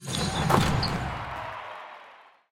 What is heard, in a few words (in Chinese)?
转到赛事; nba